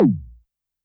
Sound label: Tom Synth, YAMAHA RM1X